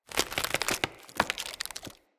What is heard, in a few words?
Mockery Foley Stand; Enemy; Mockeries; Characters